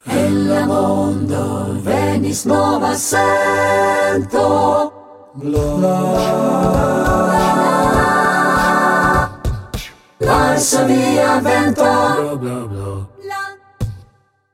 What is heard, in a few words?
jingle